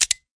Switch; The Denpa Men; Android

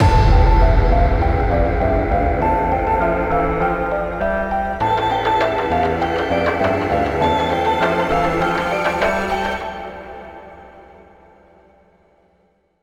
Relic Sign Stinger

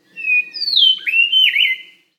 wren

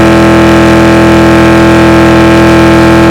cobra427 on low

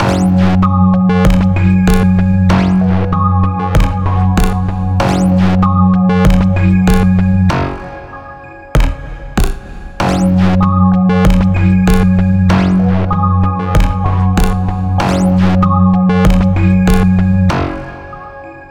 Area Alert Loop; tokyo 42; Kowlon

